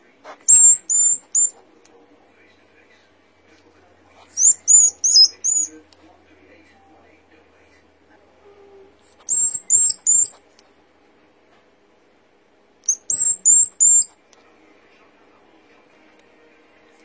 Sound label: hoglet calling mum; European Hedgehog; Vocalisation